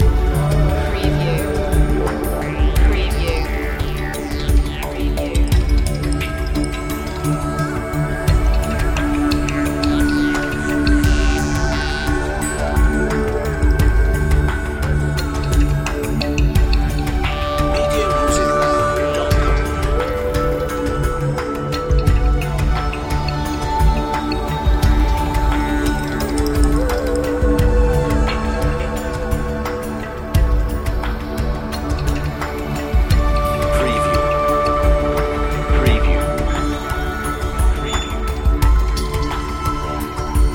rainforest loop

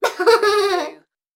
Child Laugh; beanstalk audio

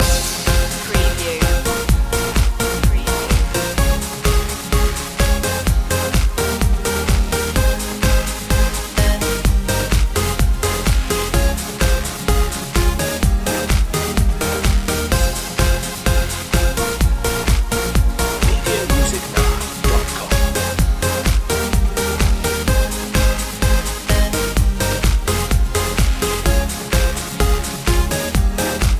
starship dance loop

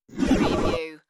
Static Blip

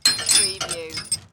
Chain Metal Clanging

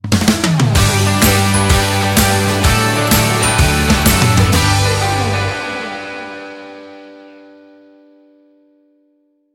joyride stinger

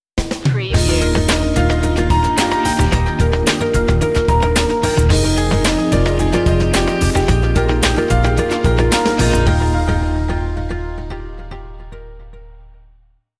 bright and corporate

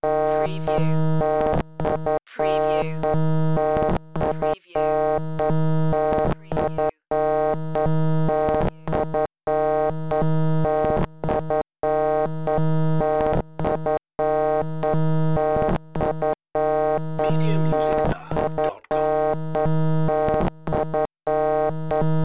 Computer Readout

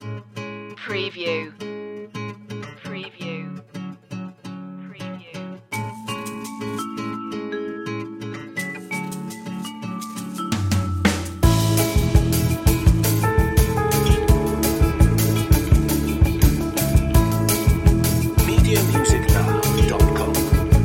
emotional brazil lounge

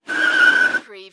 Car or motorcycle brake, tyre screech

